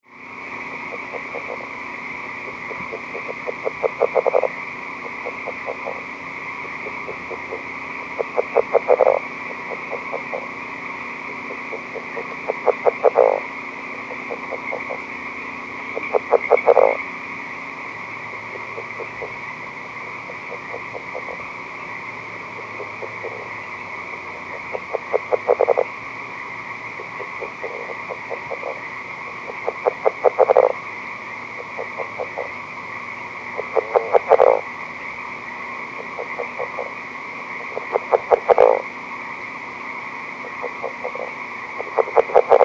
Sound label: rdraytonii, frogs